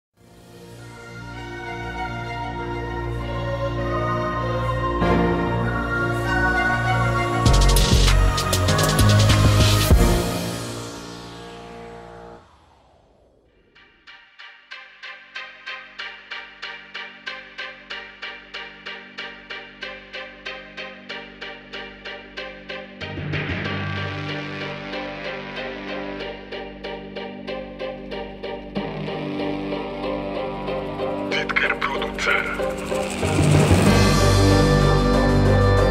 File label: hard aggressive string battle; prototype